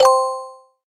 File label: new message